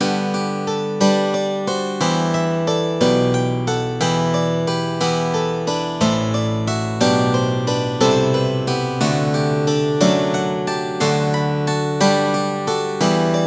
steel string guitar

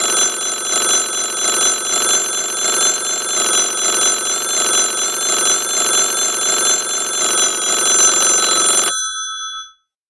telephone bass

